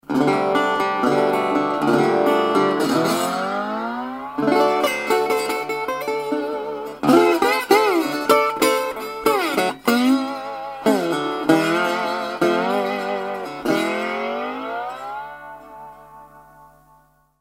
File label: Red cutaway Tricone, Delta Resonator Guitars